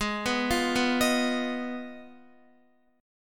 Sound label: Ab Minor 6th down, Guitar; arpeggiated; JGuitar